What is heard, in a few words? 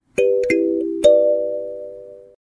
call calling